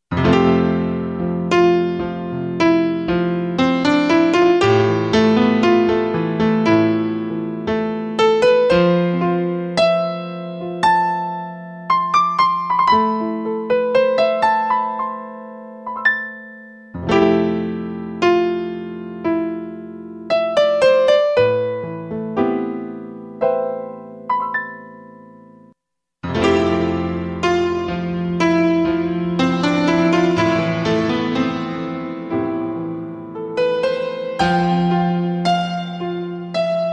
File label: REV 1 HALL, Yamaha REX50